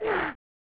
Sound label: Gasp!; The Pagemaster; SNES